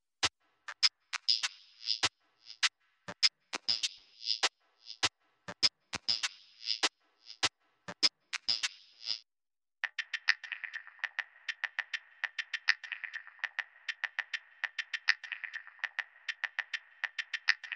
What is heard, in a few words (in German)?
geiger roh